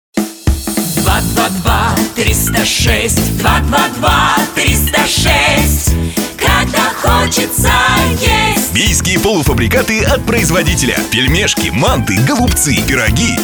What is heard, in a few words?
PELMENI song